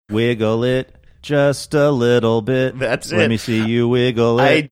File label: singing wiggle it just a little bit